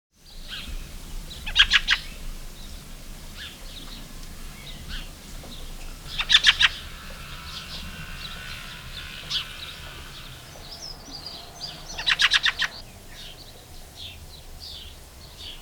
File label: Fieldfare